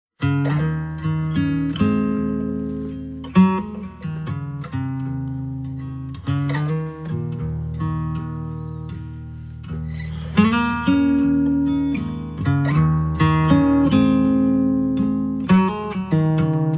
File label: Night Train Blues